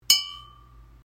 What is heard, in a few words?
completion chime short